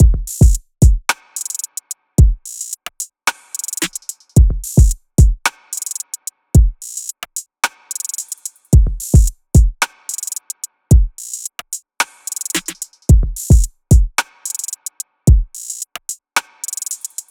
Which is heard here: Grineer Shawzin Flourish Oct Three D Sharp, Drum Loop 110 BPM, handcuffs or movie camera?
Drum Loop 110 BPM